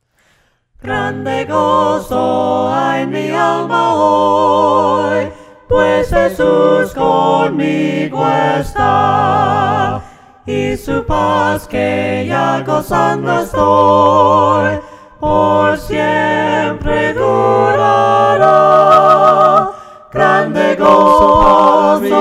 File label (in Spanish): VOCES, himnos a capella; cantos del camino